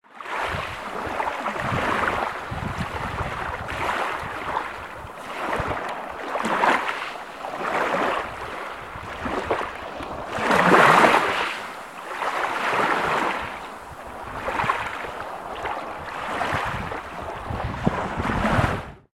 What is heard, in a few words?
waterwave